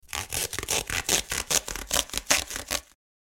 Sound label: Carton box cutting with scissors